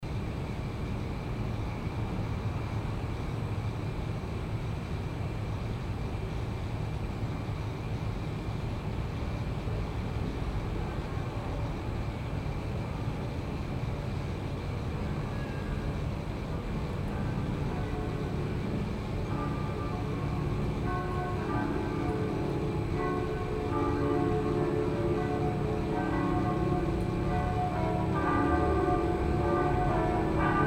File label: padova bells